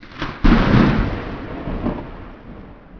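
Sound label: thunderbolt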